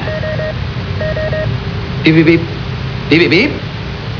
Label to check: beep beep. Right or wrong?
Right